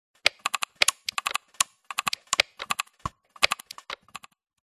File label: science fiction steam punk machine operate clicks; Audio Mangler